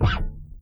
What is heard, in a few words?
STNG NOISE, PEDULLA BASS; Masterbits CLIMAX 7 - Bass